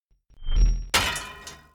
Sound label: Metal Part Pipe hit; Lucius III